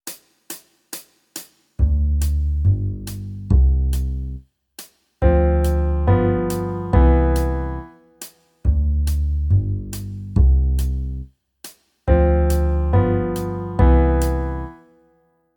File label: Jazz backing; Guitar Techniques 2019 Issue 294 Spring